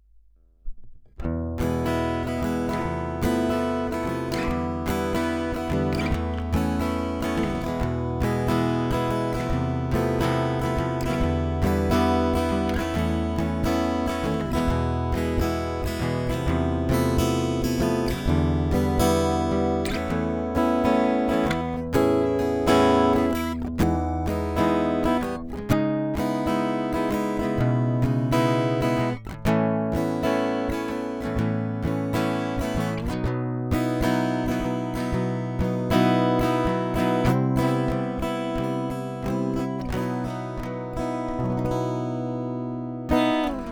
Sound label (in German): HarleyBenton GS Travel E Mahagoni; Harley Benton GS-Travel-E Mahogany